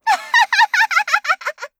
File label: laugh; Eternal Return; Garnet; KOR